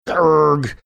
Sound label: ergh